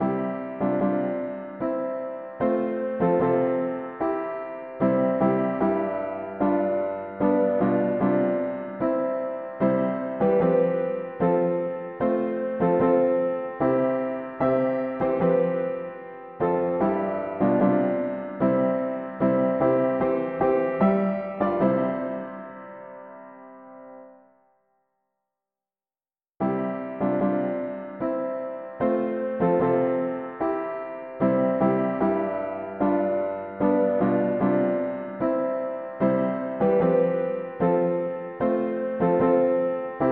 mono piano; Vers le ciel; hymns

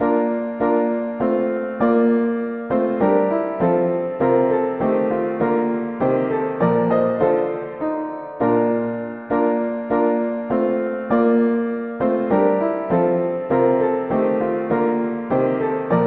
Gloire et louange mono piano

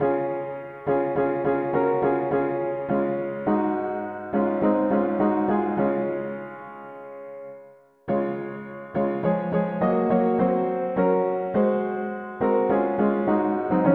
Un chretien je croyait etre mono piano; hymns

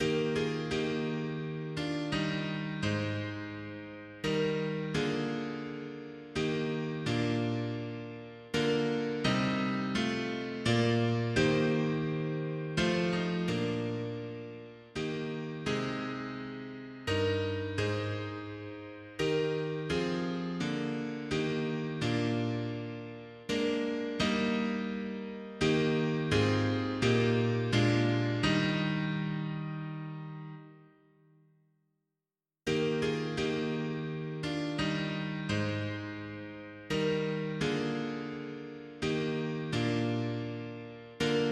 mono piano, cantiques; hymns; Jesus mon Fort

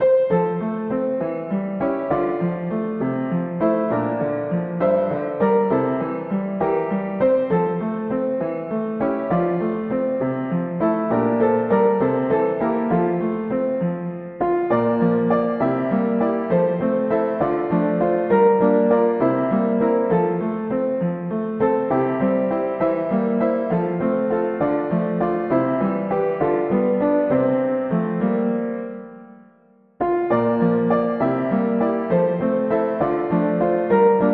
Les cieux racontent mono piano

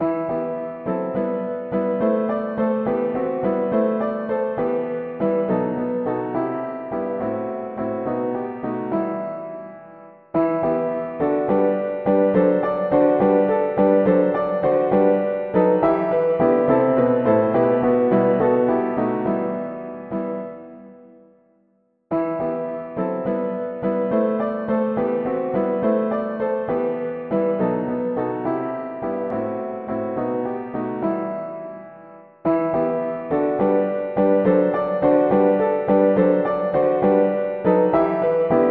mono piano, cantiques; hymns; O jour d angoisse

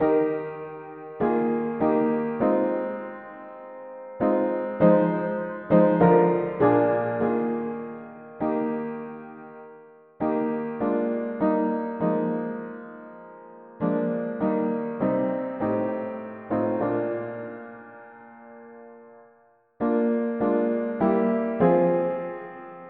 mono piano, cantiques; hymns; O mon sauveur

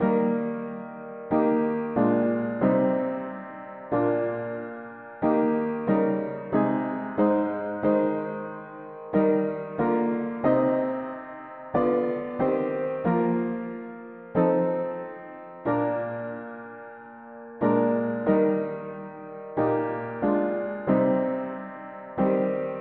mono piano; Qui peut sonder; hymns

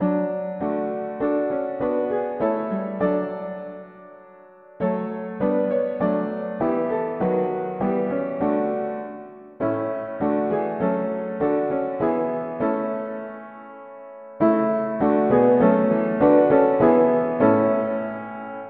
Je chanterai Seigneur mono piano

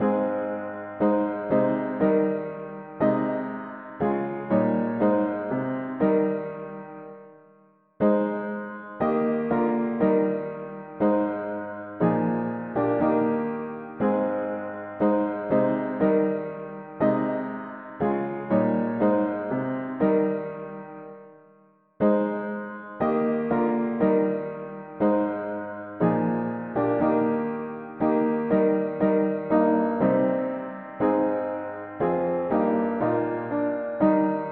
mono piano; A l Agneau revient toute gloire; hymns

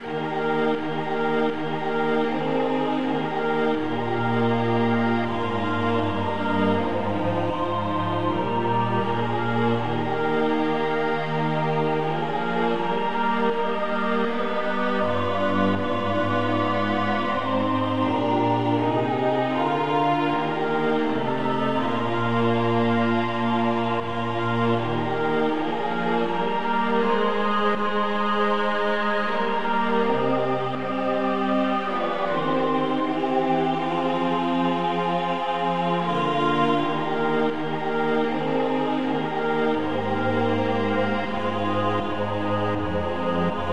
polyinstru, cantiques; Notre Dieu notre Pere combien sont merveilleux; hymns

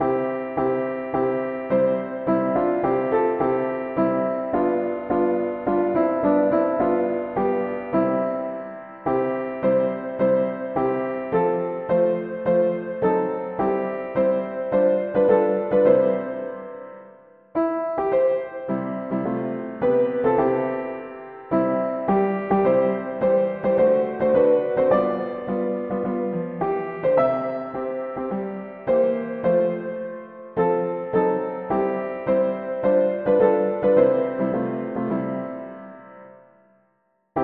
Bientot Jesus va nous prendre mono piano; hymns

